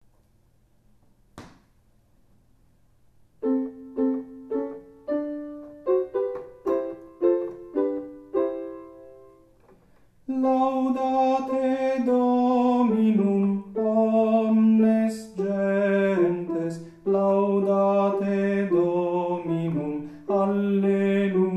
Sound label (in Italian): Laudate Dominum soprani; Laudate Dominum 2011